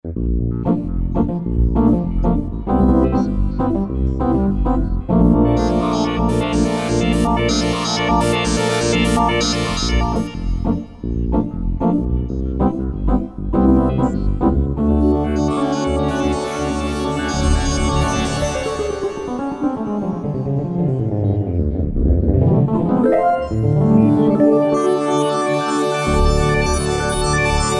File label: Stereo Waves, Korg Wavestation A-D